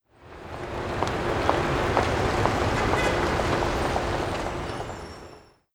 CITY NIGHT

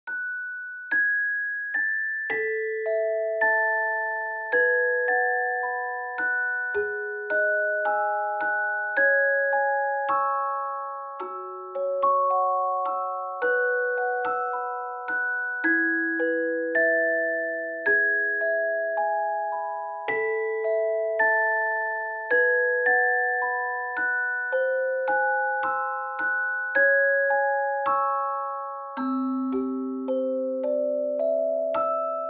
Glocken Arrange Ver

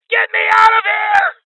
Injury Male; rebel cops 2019 gamerip; Voices

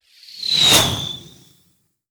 archer arrow flying

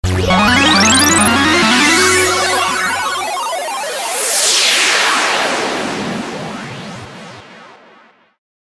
Level Transition; Super Head Esploder X; Android

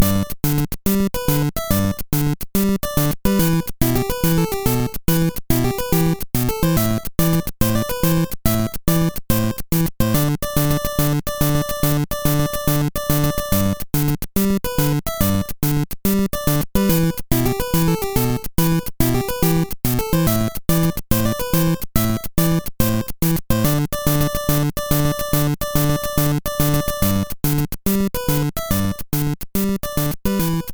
Game B Victory; Radar Mission; GB

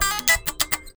Guitar Strum; Dog's Life

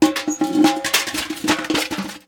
Buckets Jump Scare; The Inn

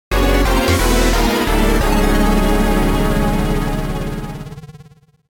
Failure; Teen Titans Go Stellar Showdown; flash online gamerip 2017

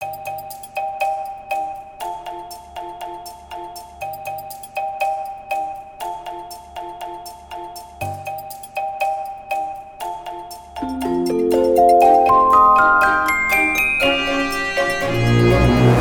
Elephant Start; Gnarled Forest; lego universe macos windows gamerip 2010

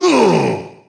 Samurai pain

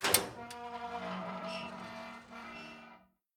Metal Bar Doors Open; The Town

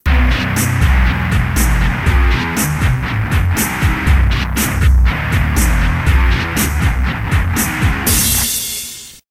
Jingle loser; Gotcha! Extreme Paintball; Xbox

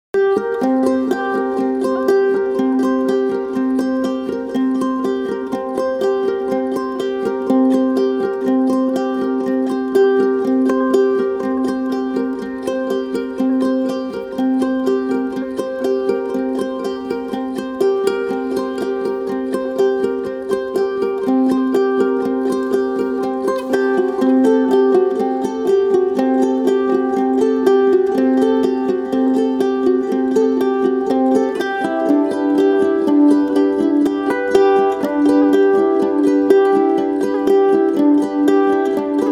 Acoustic; Moon-Touched

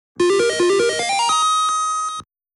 Level Up - Magic - Item Acquired; The Sword of Hope II

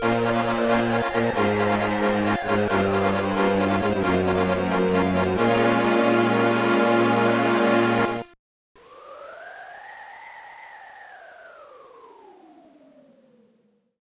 Retreat; yggdra union we'll never fight alone 2006 gba

